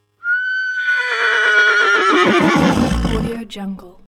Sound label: horse neigh soft appaloosa; smartsoundfx